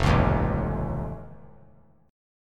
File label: F Augmented 7th F, chord; strummed; F+7